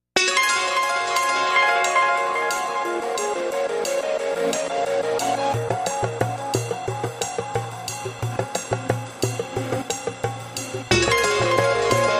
Jazzy

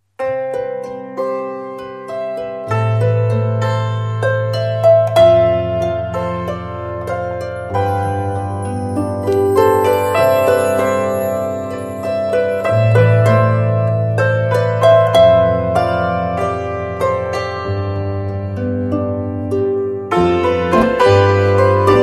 No Percussion

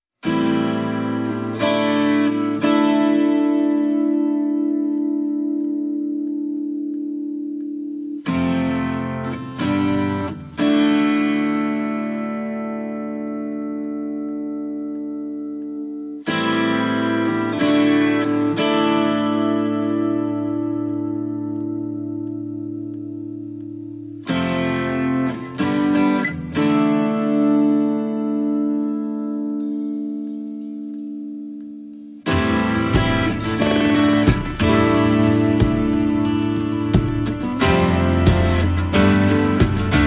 Rhythm Section

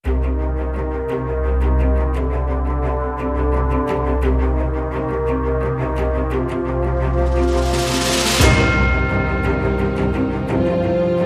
No Harmonica

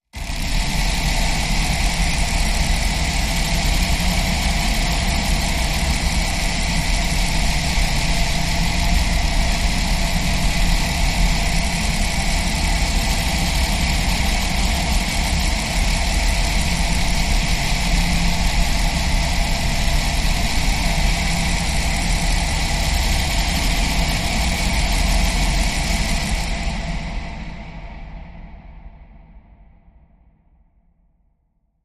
Cycle Sprints Atmosphere

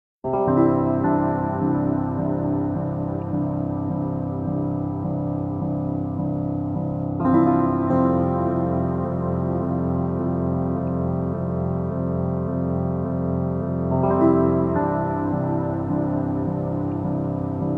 Pianos

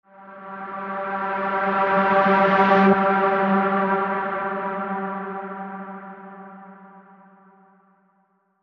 Reverse

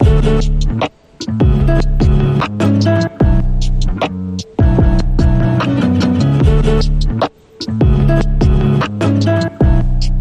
MPC Guitar

